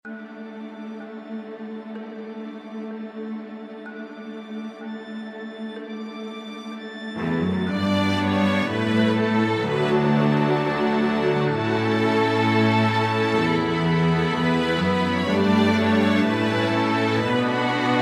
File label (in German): Orchester gross metrum; PUMA